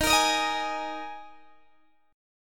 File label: Eb Suspended 4th Eb Guitar Open D